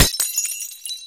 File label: glass, bullet collide